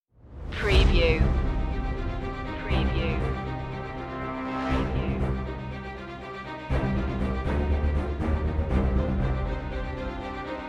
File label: no perc